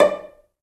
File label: Cell Pz D5; Digital Sound Factory Virtuoso